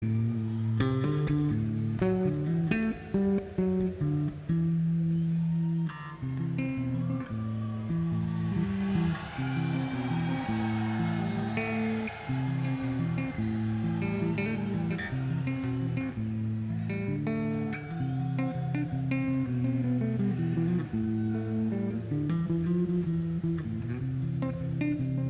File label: Bass Solo